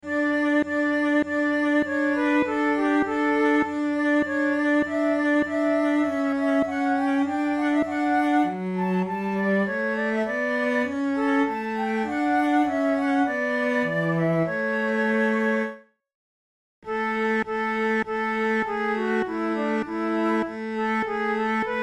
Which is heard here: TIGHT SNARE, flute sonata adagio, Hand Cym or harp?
flute sonata adagio